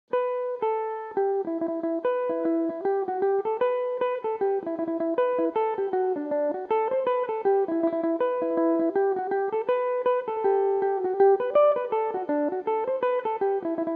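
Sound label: E minor, Irish mandolin; Fermoy Lasses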